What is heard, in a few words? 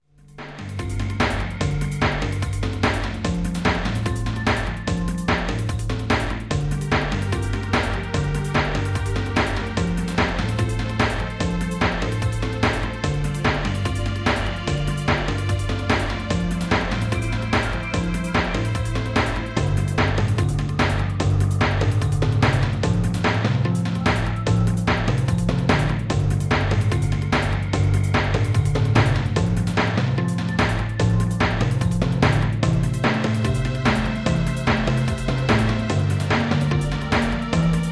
Danger Level; Haven Forest; Jetboard